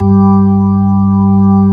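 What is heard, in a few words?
ORG, organ; Best Service Gigapack 2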